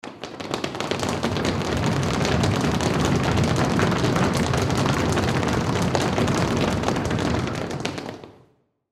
Crowd Run Indoor Long; Crowds; Human; Blastwave

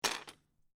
Lego, Single Lego Piece Drop Onto Pile Of Pieces; Miscellaneous Toy; Miscellaneous Toy Sound Effects; Lynne Music

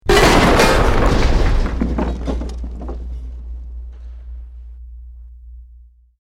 BUILDING COLLAPSE SCAFFOLDING SMALL; Created dramatic effects of buildings collapsing or breaking; Construction

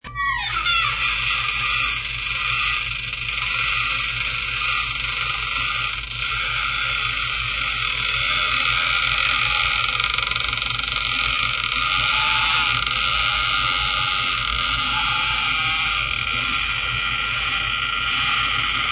Longest creak of door ever recorded; Longest Creaking Door Ever; Creaky Doors

